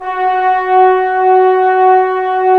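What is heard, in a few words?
brass trombones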